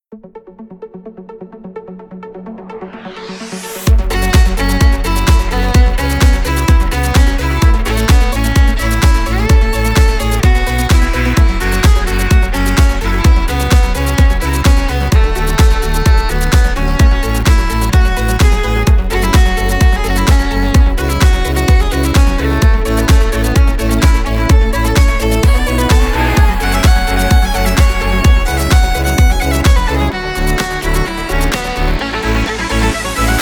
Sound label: violin